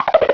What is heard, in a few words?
Cartoon Sound; PC; MARIOSFUNDAMENTALS